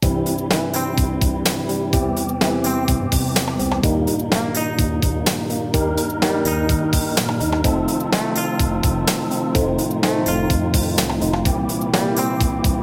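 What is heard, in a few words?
Hip Hop Soul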